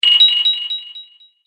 Collect Coins Rapid Delay, video game sound effects